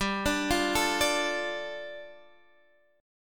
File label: G 7th Suspended 2nd G; G7sus2 arpeggiated